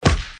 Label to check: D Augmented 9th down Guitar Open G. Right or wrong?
Wrong